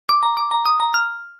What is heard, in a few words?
metallophone; line